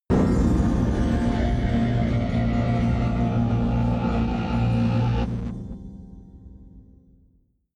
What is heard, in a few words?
atmospheric windbells, drones